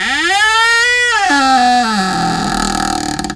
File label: DOOR squeak; doorSounds